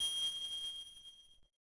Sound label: subway